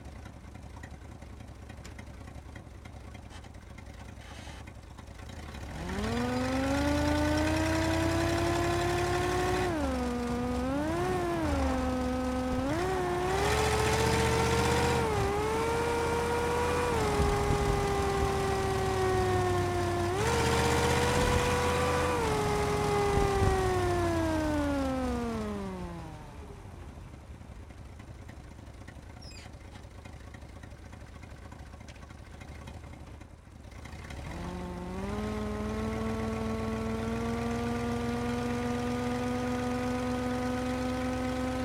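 auto interior onboard downhill swerve drive reverse uphill; sonniss gdc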